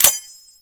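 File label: kar98 shell case; WAR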